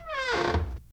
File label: doors